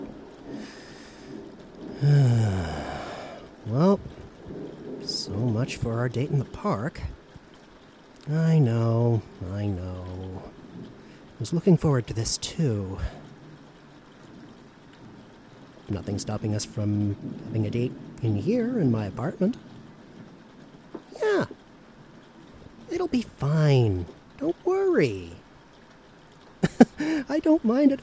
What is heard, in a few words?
Love in a Thunderstorm